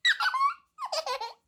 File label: laugh